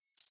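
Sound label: closing of camera lens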